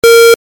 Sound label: beep